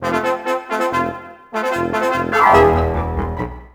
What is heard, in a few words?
Expectant